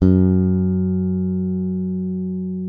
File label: F#2, FINGER MUTE; Xsample VOL-1